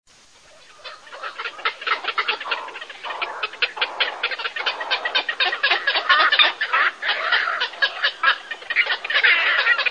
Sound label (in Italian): airone grigio